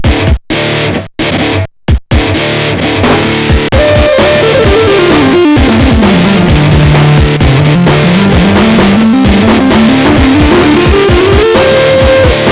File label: rock, sid MOS6581R2; Polly Rock